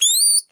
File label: Whistle, Foley; musicradar; cartoon caper